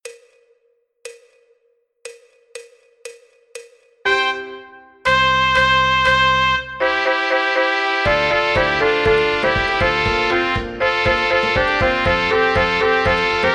Melodieinstrumente Melodie schnell

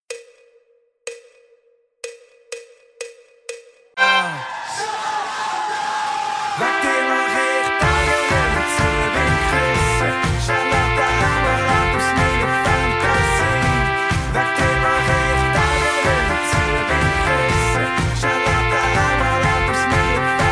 Mel Tutti Midi Refrain Melodie

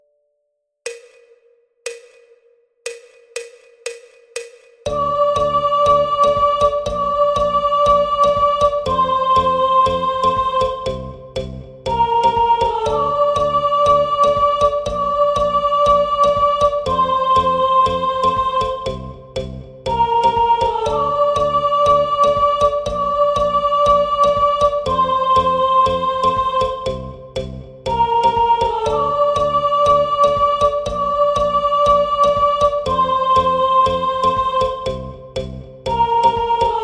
Gesang Interlude 1. Stimme

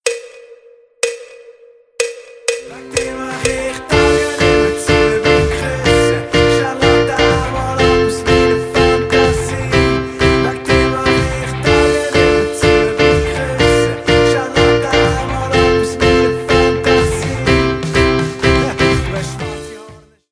Klavier Refrain Midi

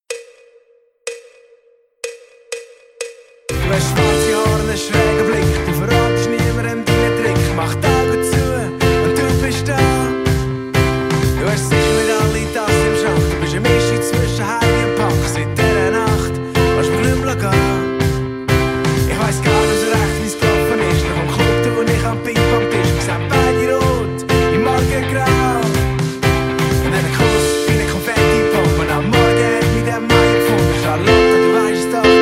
Klavier Strophe Midi

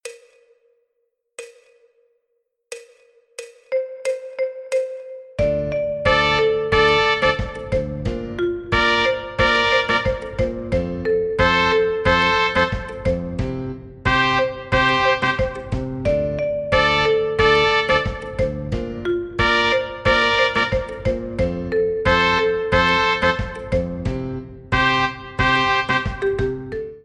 Melodieinstrumente Motiv langsam

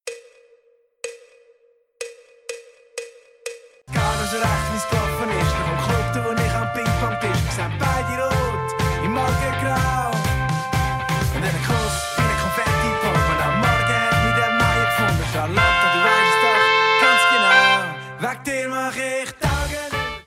Melodieinstrumente Tutti Midi Strophe

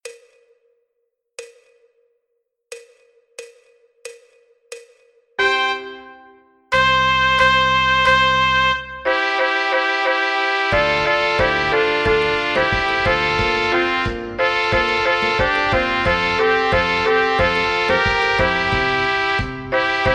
Melodieinstrumente Melodie langsam

